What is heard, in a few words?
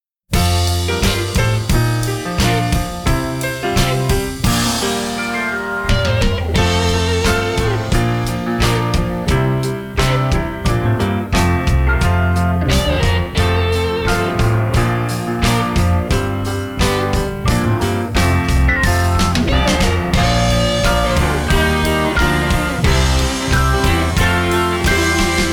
Backing Track